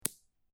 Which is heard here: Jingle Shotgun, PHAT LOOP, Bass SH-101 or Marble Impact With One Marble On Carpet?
Marble Impact With One Marble On Carpet